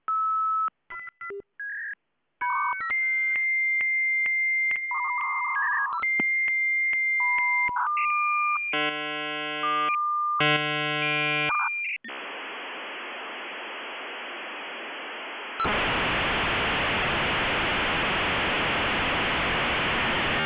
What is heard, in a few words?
Motorola SM56 V92 laserbeam